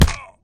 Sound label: body impact self; physics